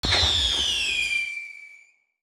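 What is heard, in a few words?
Fireworks Explosion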